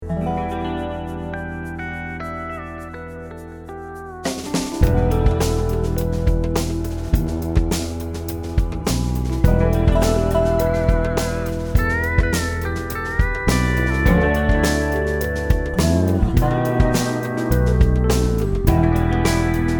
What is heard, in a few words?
Steel Dobro